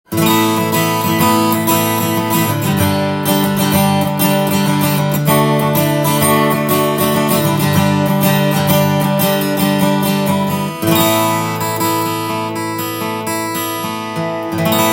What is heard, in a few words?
yamaha ll, guitar